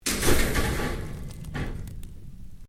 Cage Wire Move, Metal wire cage; Factories

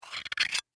Mint Tin Lid Open, Foley, Mint, Tin, Lid, Open; Lids; BlastWave

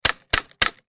Industry Electric Staple Gun Three Times; Staple Guns; Machines

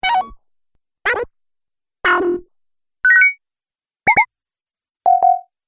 Multimedia Medium Sound; High Tech Sound Effects

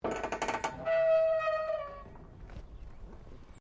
PLAYGROUND METAL SEESAW OPERATING; Various playgorund equipment; Playgrounds

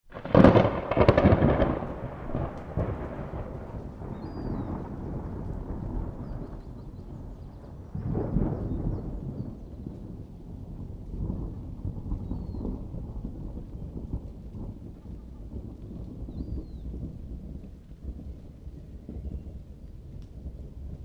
Thunder Clap Electric Storm; Weather

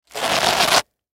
Rubber Stretch, Rubber Stress Stretch Rub; Miscellaneous Office Sound Effects; Blastwave; Foley

